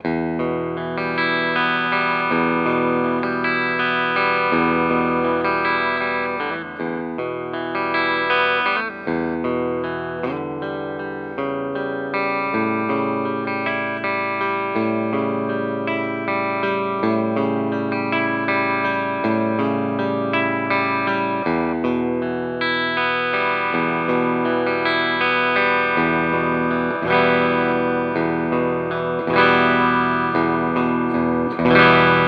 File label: Ibanez Artcore